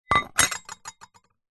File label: trowel metal set down on tiled ground; industrial